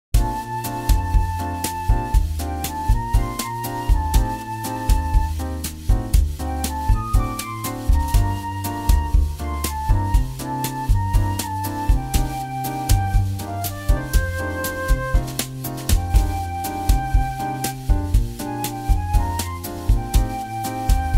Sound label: Bossanova